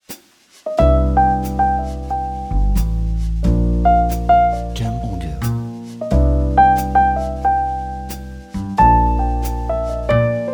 Jazz Background Piano

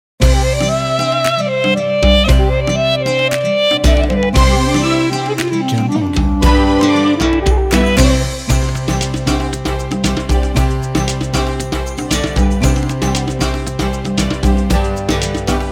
Arabian Music Ramadan

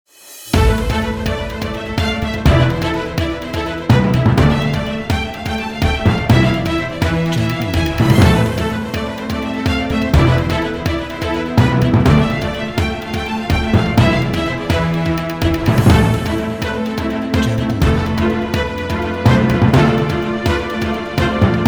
Epic Uplifting

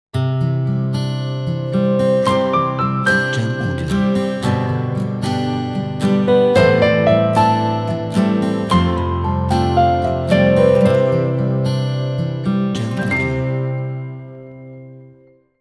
Soft Guitar Intro Logo

